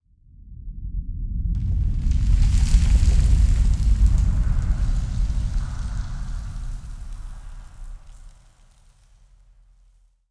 cave, ambient; Minecraft; Conquest Default

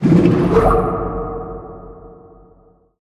scream, ghast; mob; Minecraft; Conquest Default

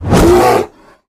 warning, polar bear; mob; Minecraft; Conquest Default

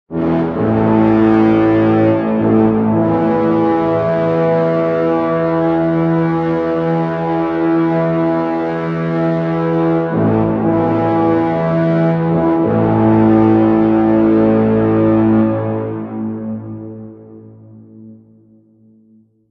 horn; minecraft; Votive Content

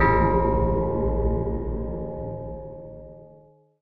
bell use; Minecraft; Conquest Default

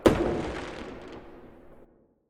blast far, fireworks; Minecraft; Conquest Default

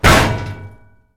metal, zombie; mob; Minecraft; Votive World

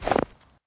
snow, step; Minecraft; Conquest Default

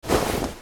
equip leather, armor; item; Minecraft; Votive World

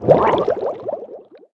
drown, player hurt; Minecraft; Conquest Default

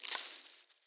pick from bush, sweet berries; item; Minecraft; Conquest Default

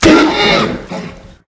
horse hit; Minecraft; Votive World

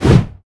strong, player attack; Minecraft; Votive World

